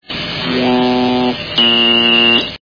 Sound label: 22k laser